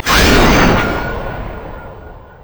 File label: dash strike; LoL; yasuo base